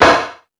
Lazer Drums, drum machine

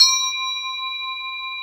FINGER BELL C5

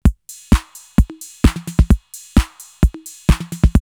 808 Urgent 130, drumloops, DrumMachines; X-Static Goldmine

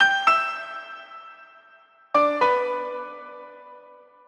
112 A Abstract Piano, Melodic Loops; Electronisounds

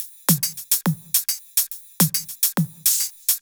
Shuffled Loops, 140 BPM; Vengeance - Essential Clubsounds Vol.4

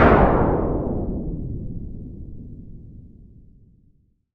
noise fx; Masterbits; Switch FX

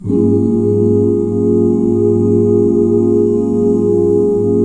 OOO CHORDS, Vocal Planet, Jazz; ILIO; Jazz & FX

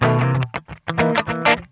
Funk Guitar; Zero G - Funk Guitar

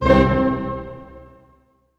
STAC C F, ORCH STAC; Sound On Sound - Peter Siedleczek's Orchestra

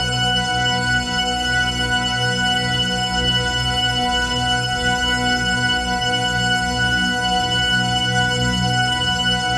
CELTIC PAD; Bolder Sounds - Granular

